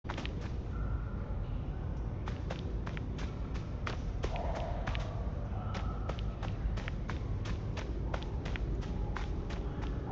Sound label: footsteps dirt; kena bridge of spirits